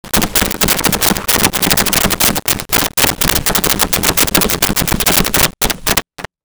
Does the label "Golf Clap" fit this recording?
Yes